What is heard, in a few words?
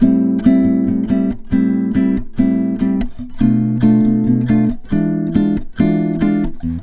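electric guitar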